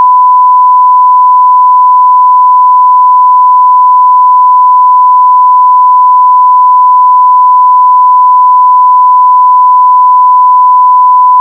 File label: 1khz sine lr inphase